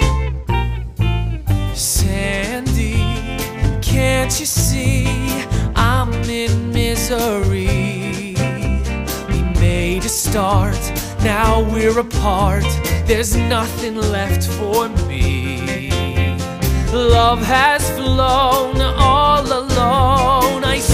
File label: sandy guide vocal